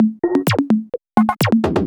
VFX2 Loop 128 BPM

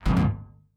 BD Gate FX, Bassdrums; Vengeance Samples

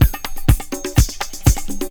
mix percussion loops, 125; PS-28 Vocal House Samples

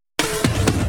Fill Ins 128BPM; Vengeance Samples

